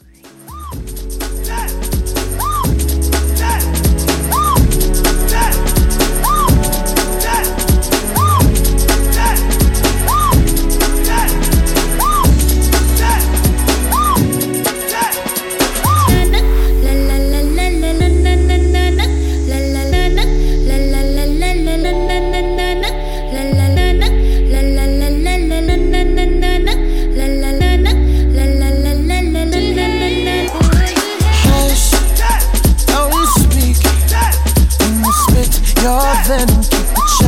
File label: Re Drum 125